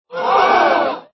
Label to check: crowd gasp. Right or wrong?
Right